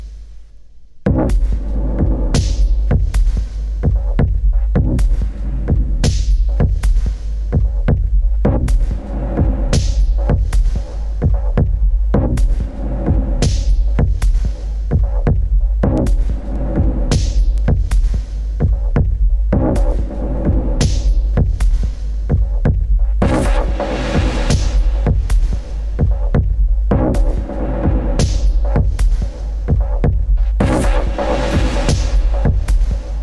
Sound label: BEAT